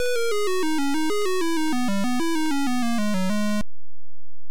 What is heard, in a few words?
Title Beeper; Martianoids